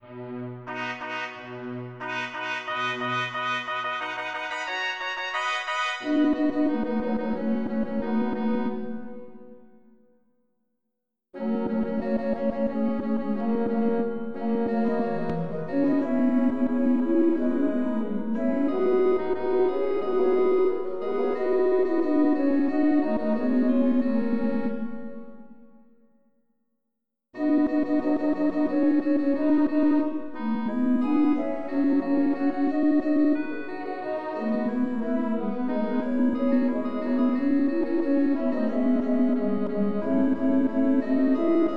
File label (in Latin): Fecit Sopr.; J.Kuhnau